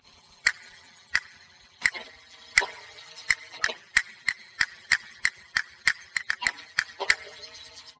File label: Castanets Violin